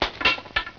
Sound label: Fight; 特酷声音素材库